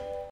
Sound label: beep hold tone; yesterday origins gamerip 2016 switch ps4 pc ios macos